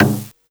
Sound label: Perc Wadaiko Casio SA-1